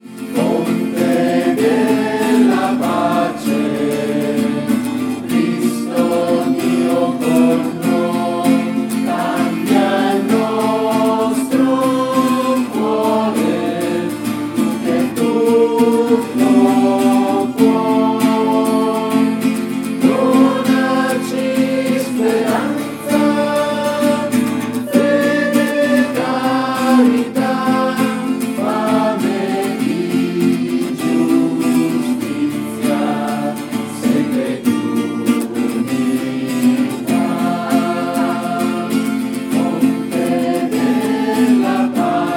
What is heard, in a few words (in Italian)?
Fonte Della Pace